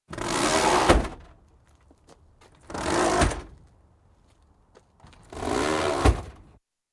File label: Fire engine, sliding panels raised; Emergency Vehicle; BBC